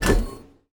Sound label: vivarium locked; Stellaris; grand archive